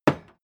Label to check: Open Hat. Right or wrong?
Wrong